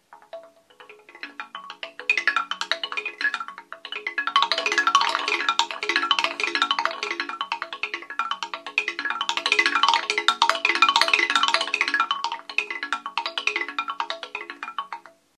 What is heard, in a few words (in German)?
Ruhrtrommel klein